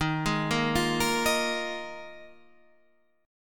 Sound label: D sharp Suspended 2nd Suspended 4th D sharp